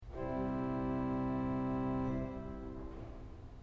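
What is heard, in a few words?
leiblichgedactl 8 C, organ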